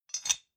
Bar Whiskey Glass Liquor Decanter Closed Light Glass Rattle; Drink Mixing